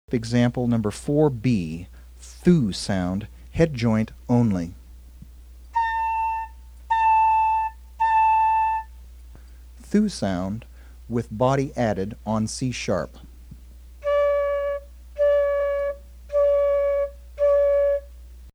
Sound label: Embou FL, Flute; Tongue going between teeth and, sometimes, lips.